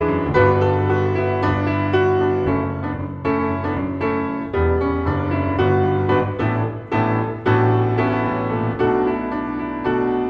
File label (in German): Improvisation am Fluegel